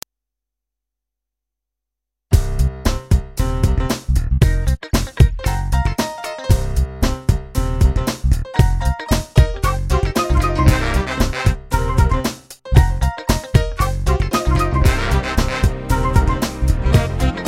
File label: funky